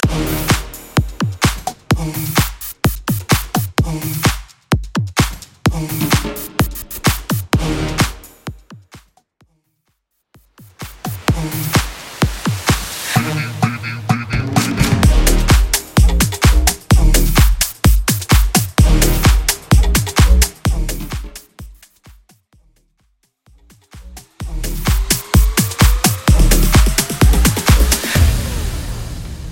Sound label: 90s EDM Edit